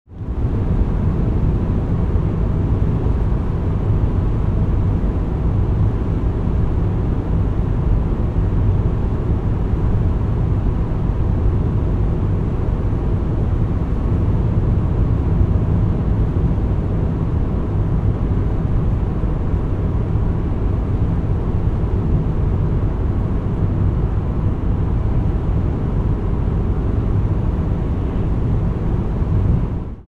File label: car interior; trasporti; auto